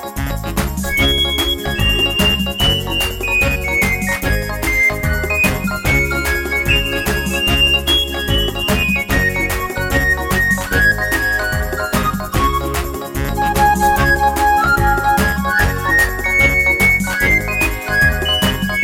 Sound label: Mad Ska